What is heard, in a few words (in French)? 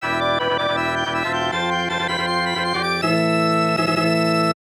Fanfare victoire